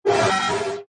Digital Sweeper Short Whoosh, Science Fiction Sound Effects